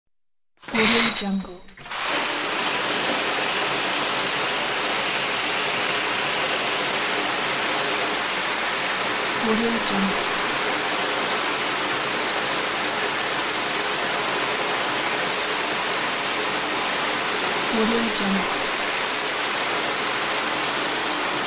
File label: shower